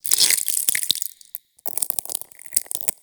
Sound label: Taunt Flesh, Proto Infested Ancient; Warframe; Abilities